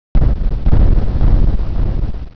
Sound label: Thunder; Quake; PainKeep